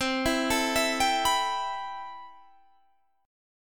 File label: C Major 7th Add 6th C